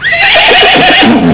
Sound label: horse neigh; Sound effects